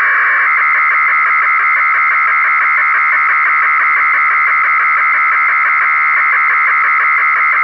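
Italian defence attaché network 1200 Bd FSK waveform; Italian MIL 1200 Bd FSK modem in IRS-mode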